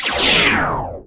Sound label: arrive in level; rund um die welten